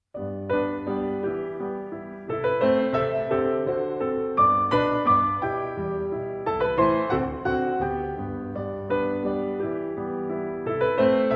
string quartet in b flat major alla danza tedesca allegro assai